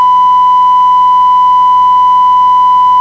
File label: alert; Steady Alert Tone